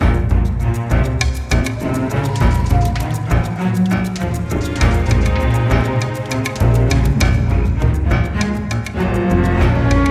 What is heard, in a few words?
Orch; Crysis